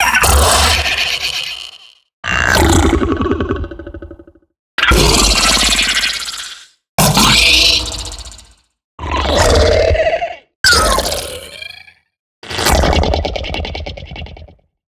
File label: building blocks